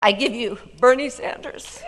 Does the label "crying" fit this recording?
Yes